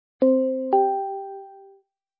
countdown remind; Feishu

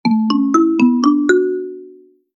speaker test; Feishu

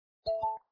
ringtone accept; Tencent; QQNT

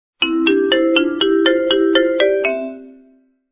ringtone caller; Tencent; QQNT

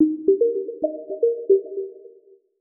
dialing; Feishu

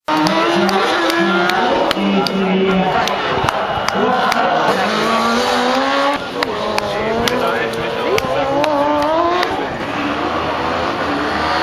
video racing; Vector; CANoe; SOMEIP Sim Multimedia

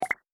listen Together Leave; NetEase; CloudMusic

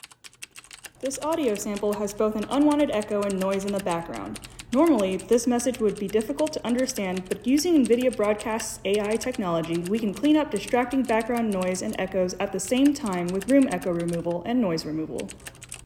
Typing Echo; OMEN Command Center

